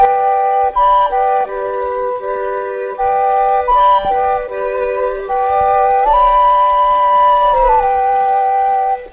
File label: flute clean